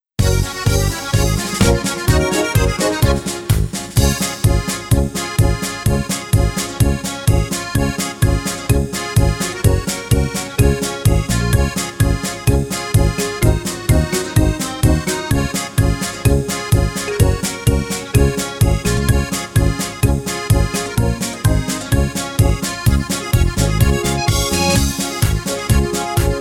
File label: left and right karaoke